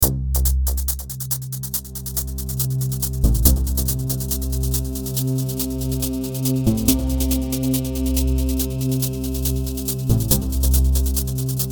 Morphox Rhythm

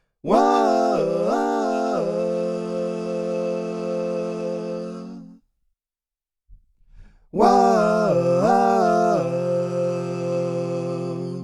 Zoom V6 Vocal Processor Harmony

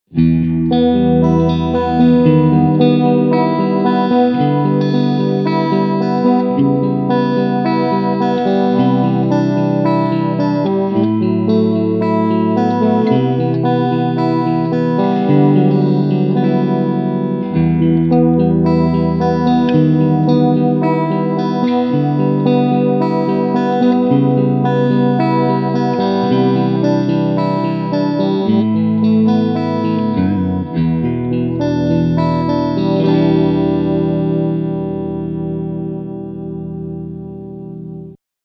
Quad Chorus; Seafoam

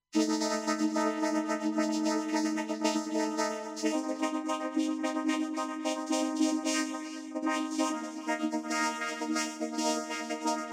xl voc dwgs

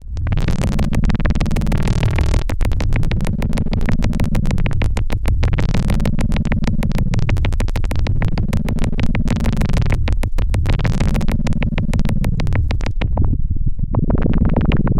experimental; pittsburgh taiga addendum 8

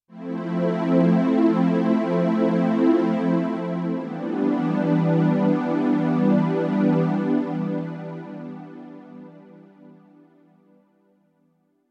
Chorus

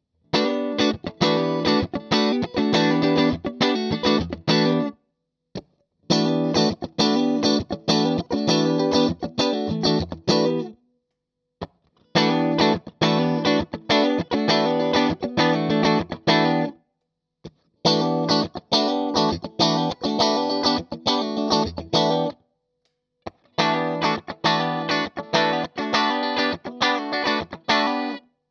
Fender strat 65 Clean funky

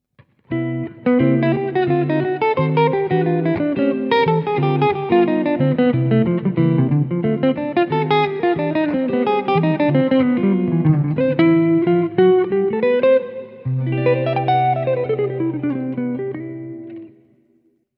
DAngelico Neck Jazz

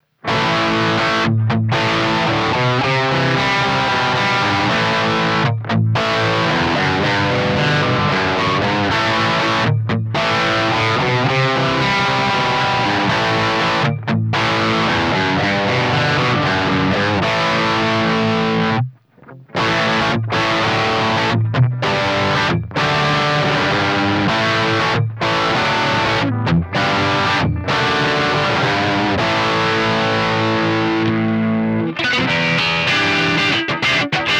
PlexiRanger; Amplitube Tonex; Ampster Original

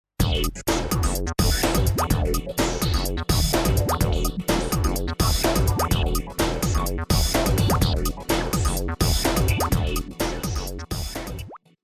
Electribe SX Big Beat

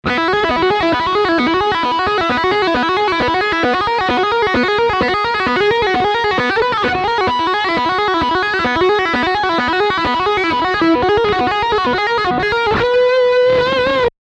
Hammer On Pull Off Humbucker

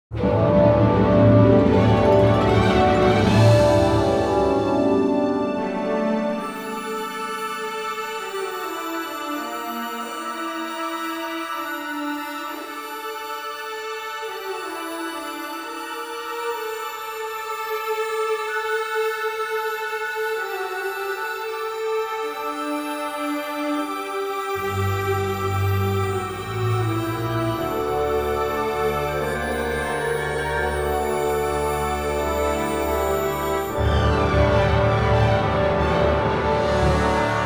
Stringrun

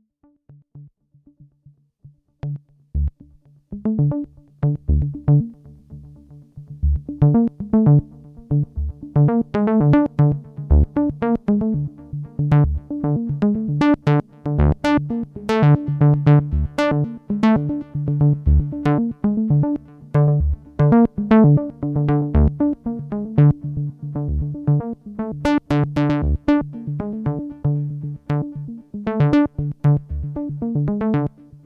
MKS80 double

